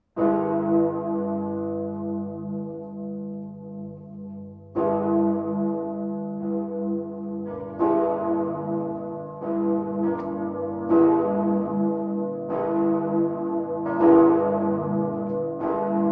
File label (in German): Simulation Bestand Nordturm Plenum